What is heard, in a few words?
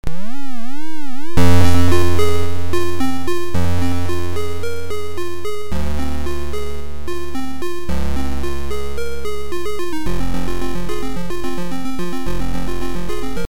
Chip Sfx Music; Nth Pong Wars